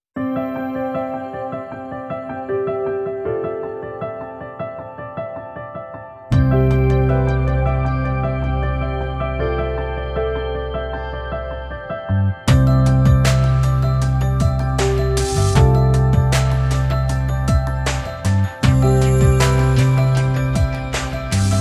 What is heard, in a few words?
church music